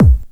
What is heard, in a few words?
dance base; Q Up Arts; Dream Experience